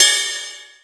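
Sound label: short bell; InVision Interactive; 40 Oz Phat Slammin Hip Hop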